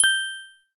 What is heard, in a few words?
doremi sharp; SKY IM-A710K-VEGA-Xpress; keytone